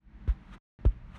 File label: Jump; LoadyDungeons